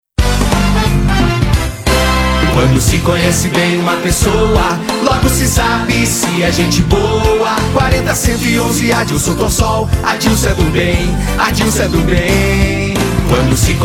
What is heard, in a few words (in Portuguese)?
Jingle VEREADOR; Serra e do bem; Adilson Torsol